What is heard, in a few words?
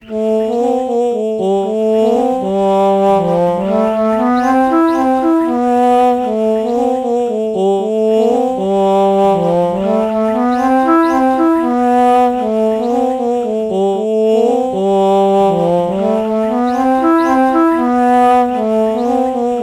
alto flute oh melody morph low